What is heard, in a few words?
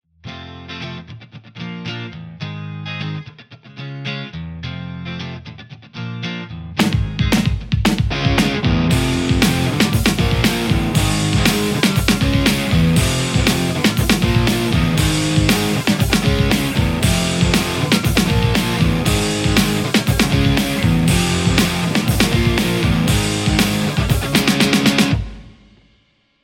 strat; igniteamps emissary v1 00